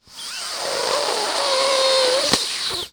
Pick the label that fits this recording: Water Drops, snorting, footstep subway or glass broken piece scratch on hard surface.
snorting